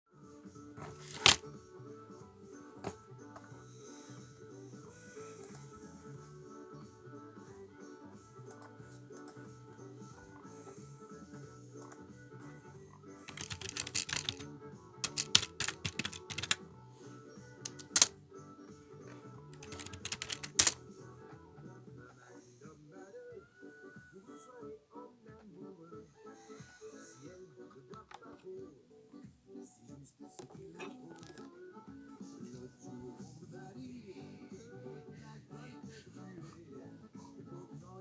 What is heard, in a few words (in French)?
bruitages bureau